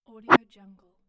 swipe woosh

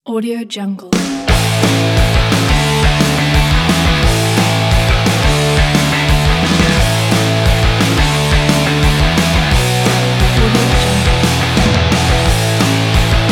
uplifting pop punk; biggroove

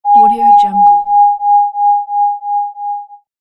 electronic beeps

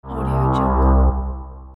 ship siren; urbazon

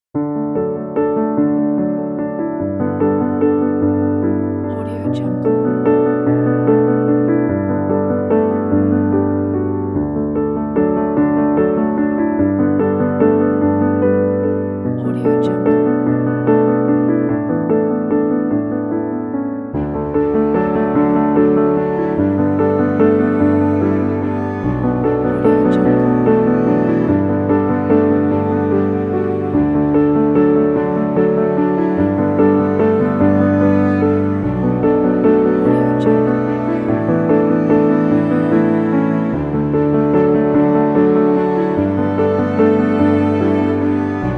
emotional piano and beautiful strings

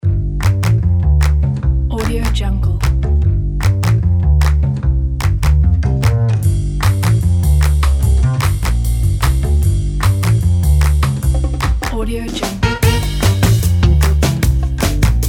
professional thief

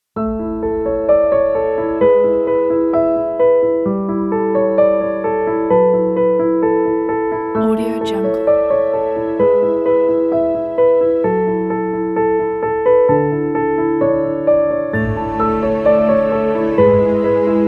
the emotional inspiring film score

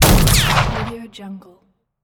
gun shot bullet ricochet

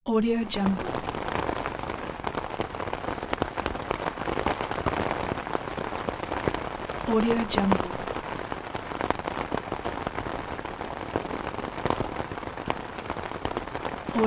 umbrella

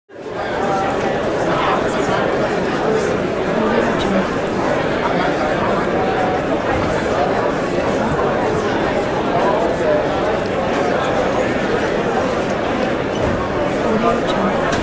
crowd talking

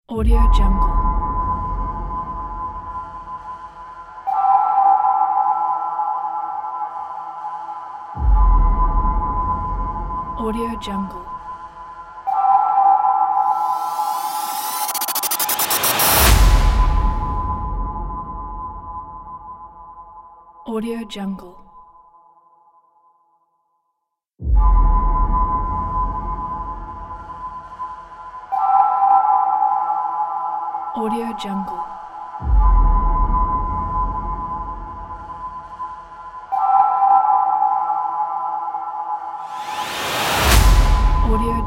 orbity tension cinematic trailer

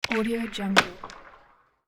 hockey shot; sound-ideas

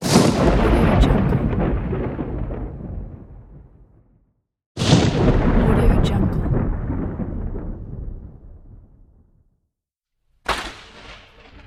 thunder pack

